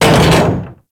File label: Step Metal Debris; Enemies; Steps